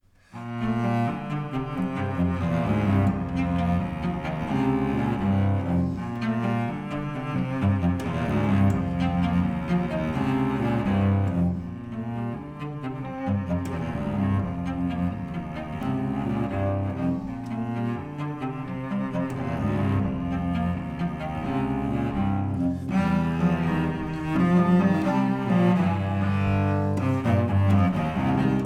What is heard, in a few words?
cello duo